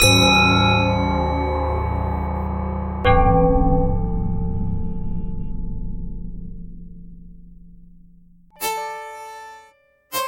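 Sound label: sitar ringing melodic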